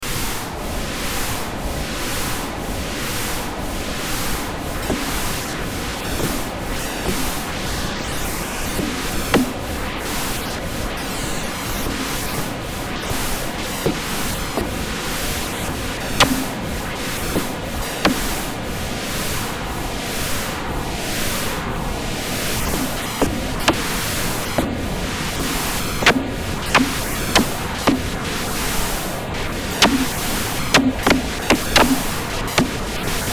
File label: Noise drones